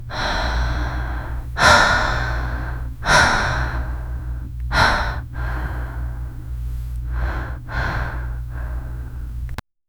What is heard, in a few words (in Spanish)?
una mujer respirando tran, breathing